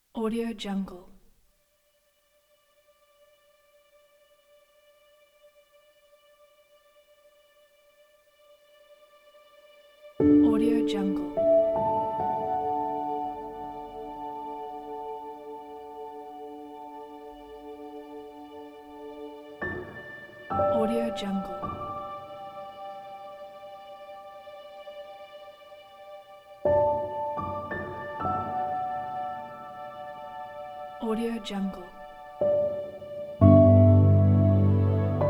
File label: piano